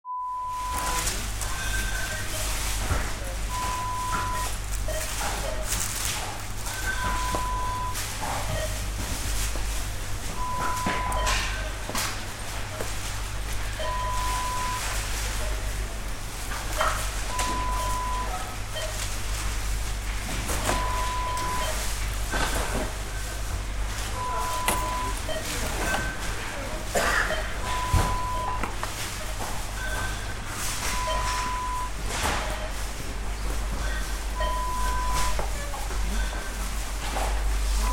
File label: supermaket ambience sound